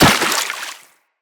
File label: Operator Run Water, Footsteps; Characters